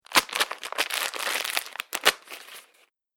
Crunching Plastic Wrapper Noise; Crinkling Sound Effects; Foley Sound Effect

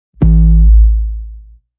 Bass Doing

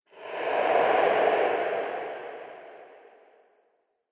Gruesome Ghost Monster Discharge; Ghost Sound Effect; Sinister, Scary, Spooky Or Just Silly

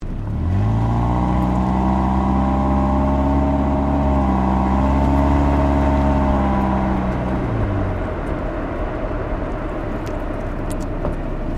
Car Toyota Yaris exhaust drive slowly; Toyota hire car; Cars (Sound Effects:Vehicles)

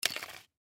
Ski Goggles Down, Ski Goggles Set Down Scrape; Skiing and Snowboarding

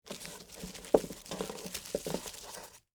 Rope Pick Up, From, Wood, Surface, Heavy, Rope; Gather; BlastWave

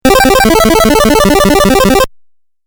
8 Bit Style Arpeggio; Video Game Sound Effects

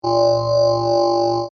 Scanner Beam Ray, Scifi

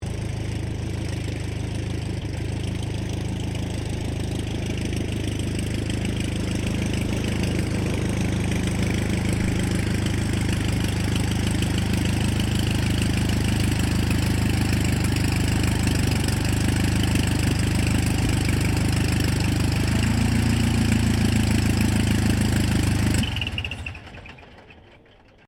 AIRCRAFT RYAN STM-S2 SHUTDOWN; 1939; Airplane; Vehicles

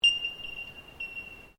Bird Bell Miner Call, common Australian birds; Animals

